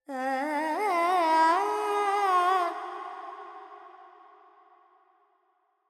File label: FX Purvi Kalyani, Carnatic Female Alaps; Vocals; Sample Banks